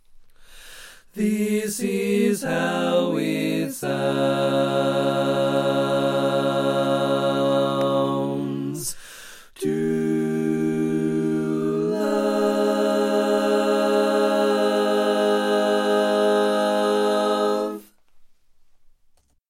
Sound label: All Parts, barbershop